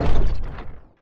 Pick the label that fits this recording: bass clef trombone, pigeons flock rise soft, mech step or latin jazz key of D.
mech step